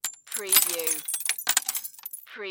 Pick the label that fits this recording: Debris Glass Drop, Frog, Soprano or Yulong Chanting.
Debris Glass Drop